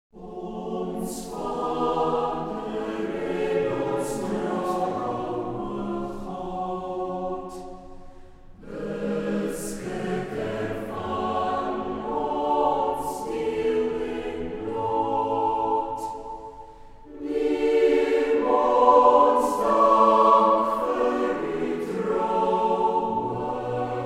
evening prayer aandgebed in konsert met afrikaanse koormusiek